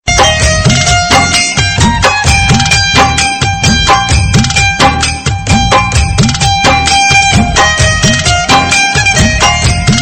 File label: Bgm